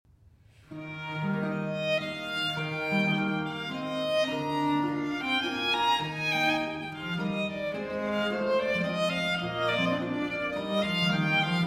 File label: Sonata For Violin and Basso Continuo in A Major V. Aria Adagio Assai; La Guerra